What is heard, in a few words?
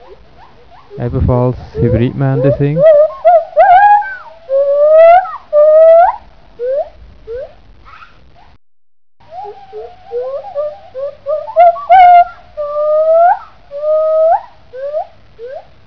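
agile gibbon male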